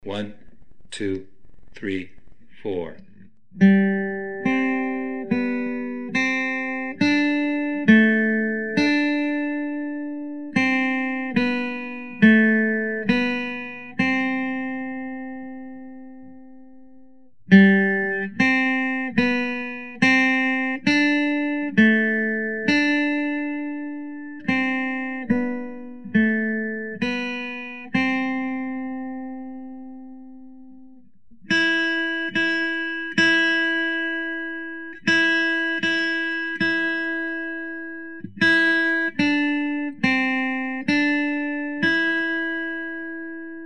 Aura lee, Gitara

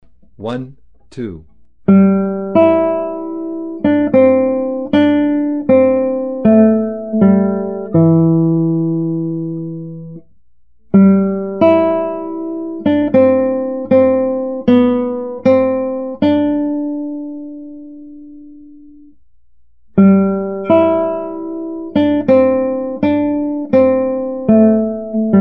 My Bonnie, gitara

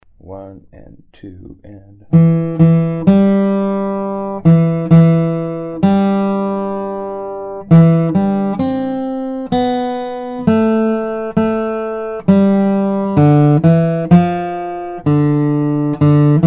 Brahms Lullaby, gitara

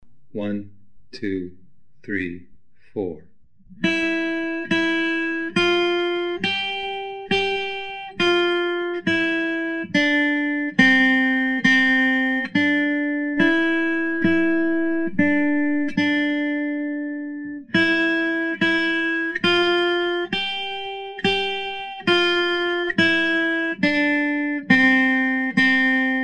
Ode to Joe, gitara

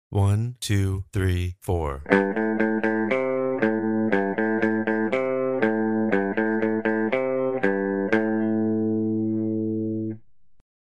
Heavy Metal Lick SLOW, gitara

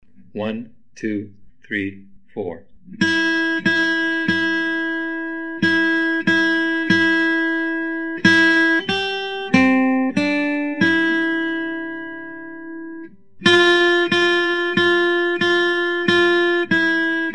Jingle Bells, gitara

